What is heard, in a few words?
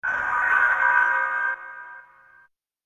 noisy swell